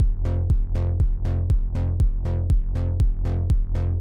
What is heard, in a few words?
Kick Bass pumper